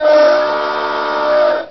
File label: Old Car Horn, cheesy lo-fi